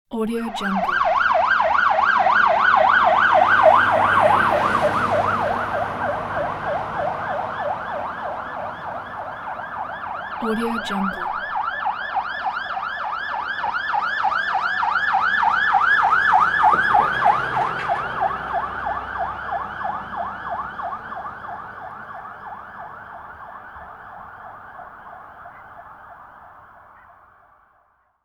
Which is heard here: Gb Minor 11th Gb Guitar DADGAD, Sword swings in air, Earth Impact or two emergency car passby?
two emergency car passby